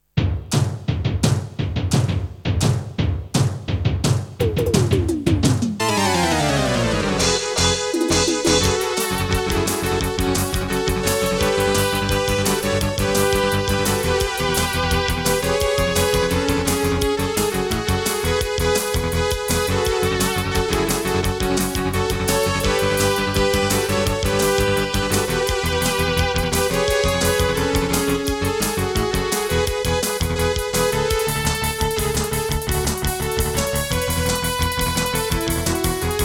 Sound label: AWE32 GM; Mega Man II; Wood Man